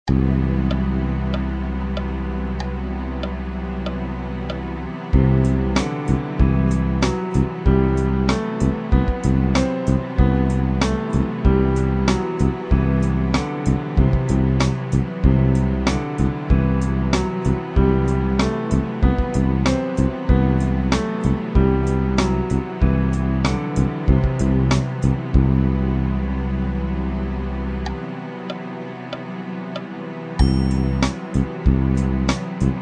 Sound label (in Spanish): lidio